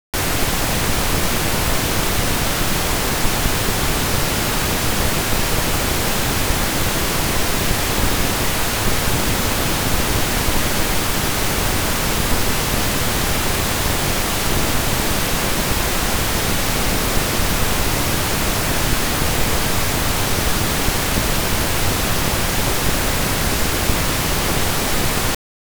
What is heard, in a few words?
Pink Noise -14 L+r [l 1／3oct． Band Noise, R 1／1oct． Band Noise]; Denon Audio Technical CD